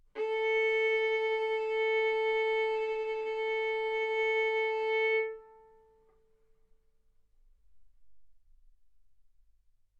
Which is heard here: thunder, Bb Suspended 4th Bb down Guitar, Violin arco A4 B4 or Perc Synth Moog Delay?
Violin arco A4 B4